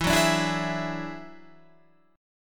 F sharp 7th Suspended 2nd Sharp 5th, E

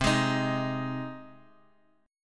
C Minor Sharp 5th C down Guitar Open G

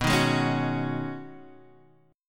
B 6th Add 9th B down Guitar Open D

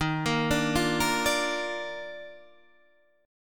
Eb Major 7th Suspended 2nd, Guitar Standard; arpeggiated; chord; JGuitar

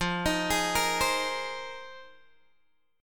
F Minor 11th, Guitar Open G

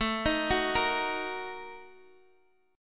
Bb-Major 7th Suspended 4th Sharp 5th

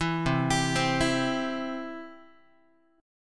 C Major, Guitar; arpeggiated; JGuitar

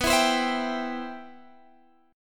C Major 7th, B, guitar chord

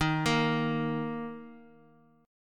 D sharp 5th; arpeggiated; x,x,13,15; JGuitar

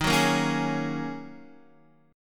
D sharp Minor 11th D sharp Guitar Open D, chord

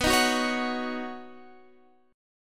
B Major Add 9th B Guitar Standard; Badd9; strummed; JGuitar

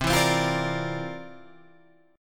C 9th, Guitar Standard, chord

